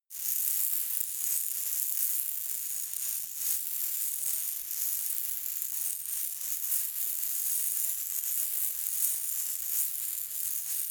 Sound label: shaker plastic egg constant rustle